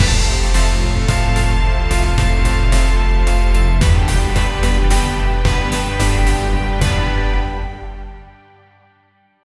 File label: loopable epic, metallic hit; 4 second